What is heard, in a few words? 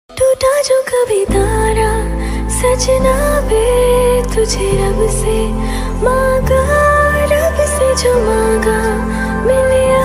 bollywood ringtone